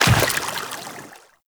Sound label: Step Water, Footsteps Walk; Warframe; Characters; Tenno